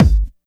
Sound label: Kick, Drum Hits